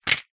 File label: household jewelry earings new on card several drop wood